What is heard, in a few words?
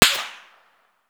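supersonic snap, bullet snap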